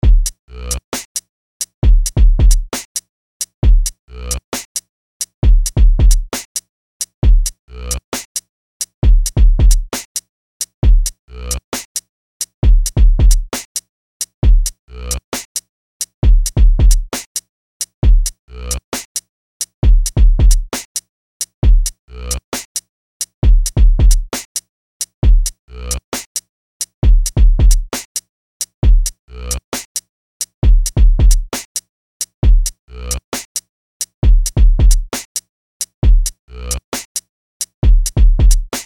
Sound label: BEATS